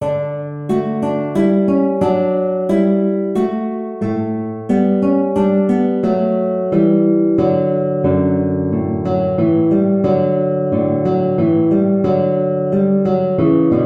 Minuet, guitar; Sir Charles Sedleys

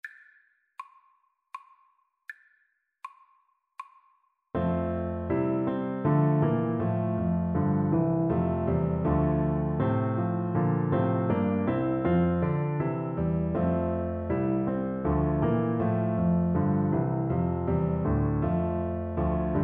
Viola

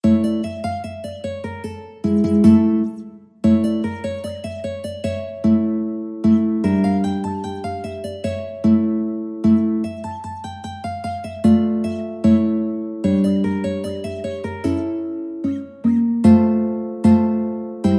Zemir Atik, mandolin

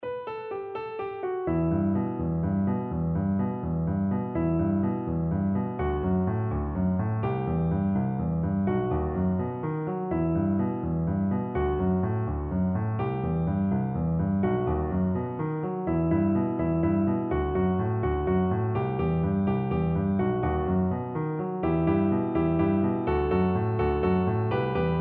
spanish violin

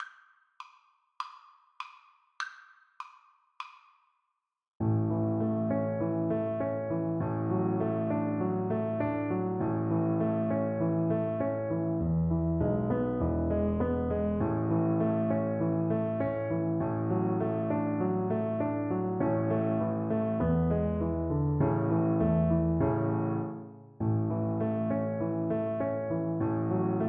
the possums tail is bare violin

